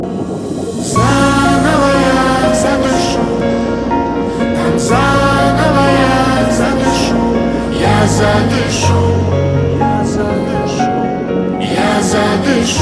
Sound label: piano mix